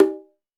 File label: Conga, Alesis D4 Drum Machines